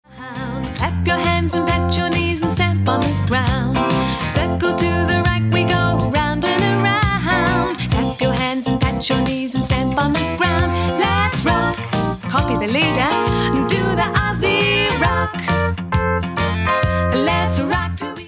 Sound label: Rock; Aussie